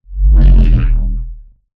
Movement Jog; Titanfall 2

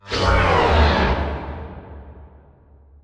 Prop Warehouse Prop Rack Stop Close; Titanfall 2; Boomtown

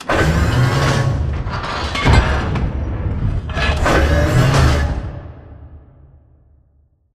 Robot Arm Pass Passer Close; Boomtown; Titanfall 2

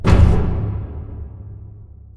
sliding door lg verb close start; Titanfall 2

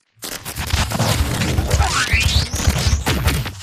Stalker Nuke Destruct Warmup; Titanfall 2

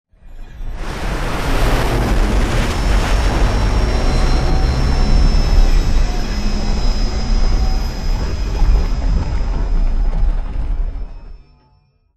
hover; colony; stratton; Titanfall 2

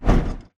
bt footstep jog parts; Titanfall 2

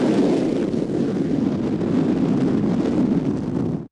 boost hover body rocket; Titanfall 2

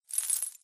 imp bullet lightballistic solidglass debris; Titanfall 2

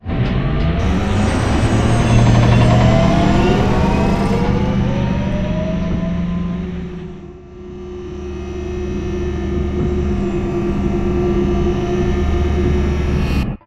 Giant Floor Panel Assemble Rotate Servo; Boomtown; Sideways Town; Titanfall 2

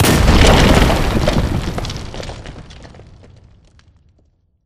Explosion Rumble Exterior Close Punch; Boomtown; Titanfall 2

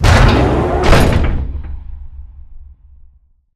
wall run panel move; beacon; Titanfall 2

